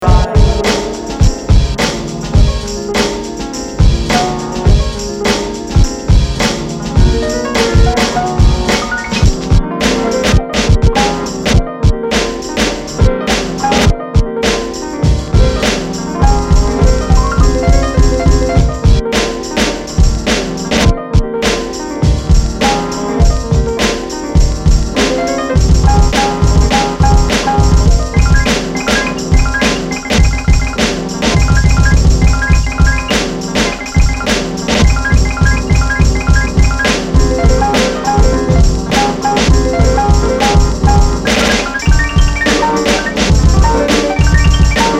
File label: Crystal Keys